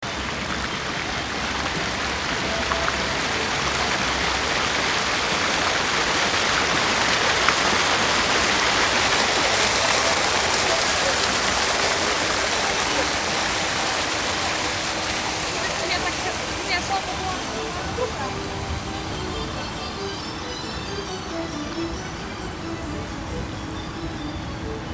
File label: minsk square